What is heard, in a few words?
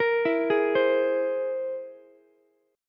C Augmented 7th, Ukulele; arpeggiated; 15,16,16,15; chord; JGuitar